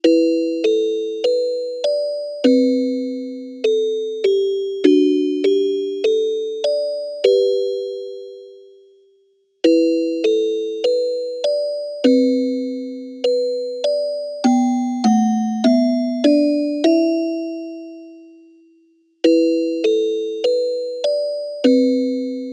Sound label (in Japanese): オルゴール